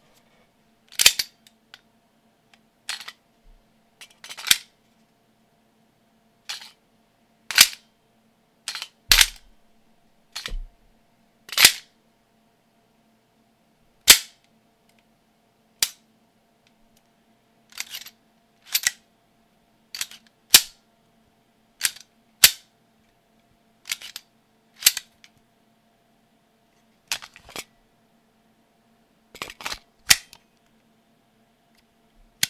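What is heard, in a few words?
recording of various pistol sounds from P320 indoors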